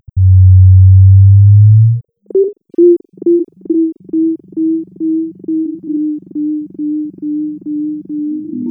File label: quasi circular echoes